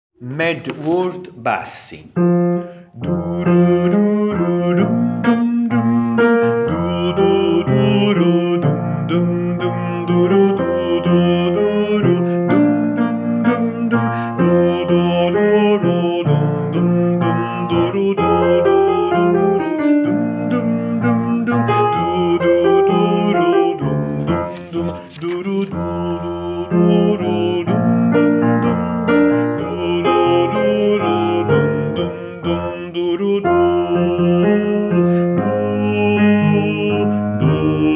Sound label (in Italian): bassi